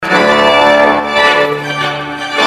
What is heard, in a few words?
trumpet; sortie de l'église, au son de Trumpet Tune de Purcell; Pierre B.